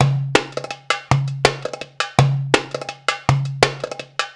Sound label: PERLP, ArabianTraditions; USB Soundscan vol.43; AKAI